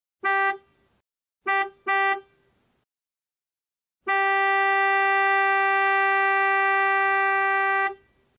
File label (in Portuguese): buzina de carro